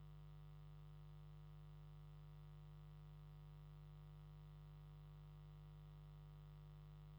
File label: stock cub 8 hum, valve amps; laney